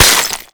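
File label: destruction icicle break large; Explosions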